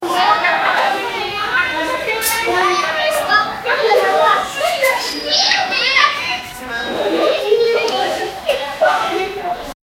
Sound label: sounds of kids being naug, classroom